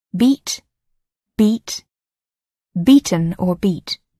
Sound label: beat